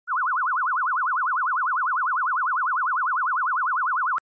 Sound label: FM 1000 sin 10